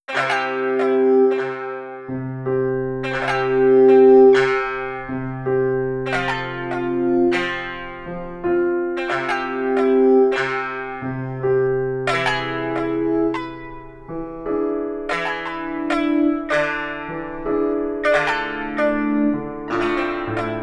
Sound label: Sad Saz